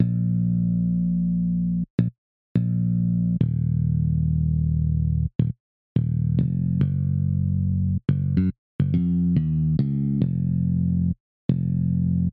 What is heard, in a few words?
bass mix